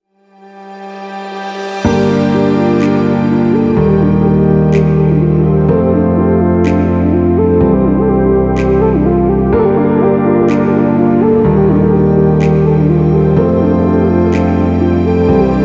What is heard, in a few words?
Arabian Inspiring